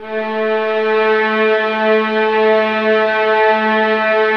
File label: VIOLINS B, Synclavier Strings; ILIO